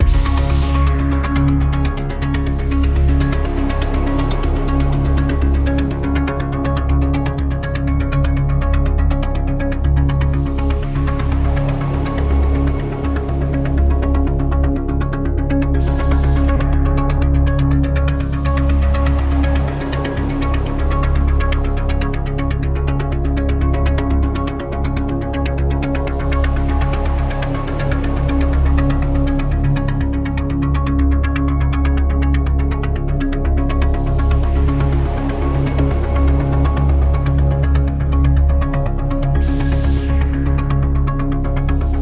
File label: Radio Toolkit Pad